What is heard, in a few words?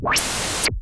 Pulse, Filtered Noise; noise hiss crackle